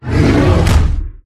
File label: black bear attack voice up